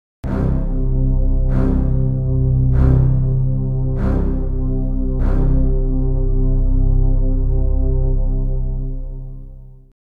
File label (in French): Catastrophe link; Jingles medley